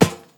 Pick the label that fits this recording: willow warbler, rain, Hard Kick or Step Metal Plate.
Step Metal Plate